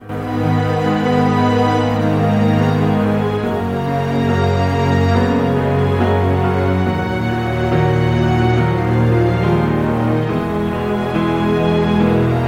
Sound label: Hollywood strings and New York piano quick test